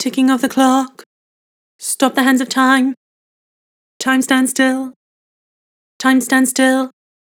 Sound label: Vibrato, Vocals; Harmor Vocals